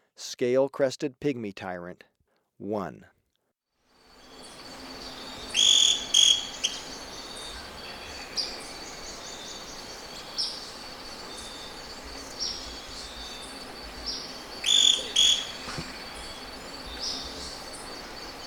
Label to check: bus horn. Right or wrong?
Wrong